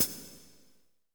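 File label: hi-hat, drums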